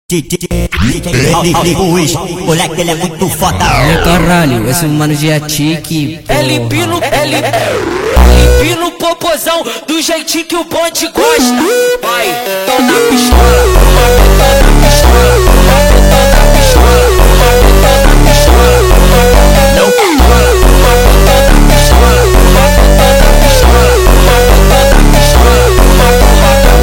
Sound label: Montagem sped Up